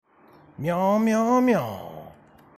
Mnneeoomnneooomneeoo

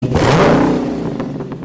Hellcat revving

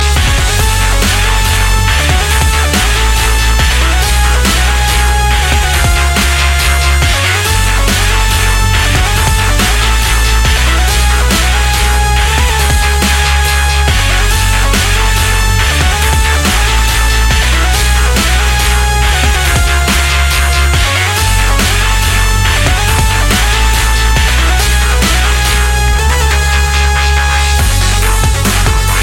Dub

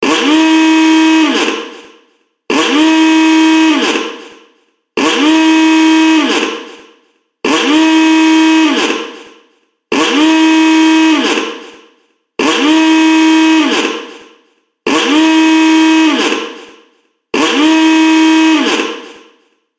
Ahooga Abandon Ship

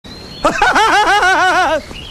laugh; PHP

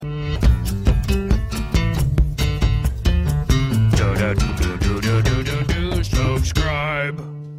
Subscribe Jingle

